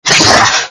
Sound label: lizard hit